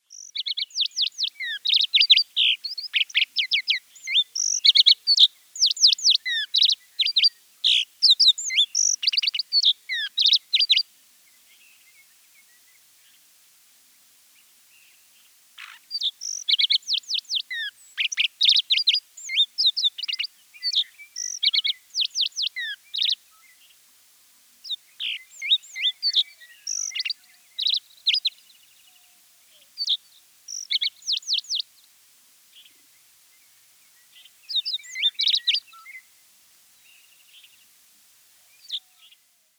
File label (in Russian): zvuki zhavoronka